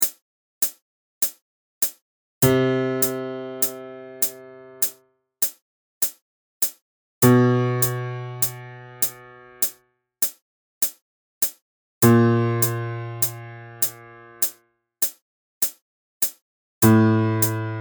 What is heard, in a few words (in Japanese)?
地声練習フレーズ Men